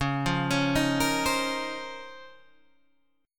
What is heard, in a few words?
Db Major 13th, Guitar, Drop D